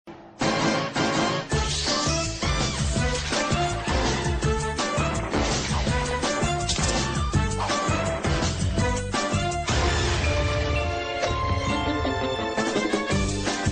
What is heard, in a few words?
cutest ringtone